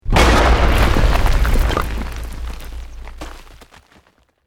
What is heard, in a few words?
EXPLOSION MEDIUM STONE ROCK; Created explosion effects, house, building or vehicle effects; Bombs